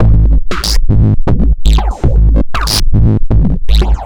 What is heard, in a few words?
Drums Strange